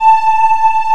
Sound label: DIDI PAD, SYNTHESIZER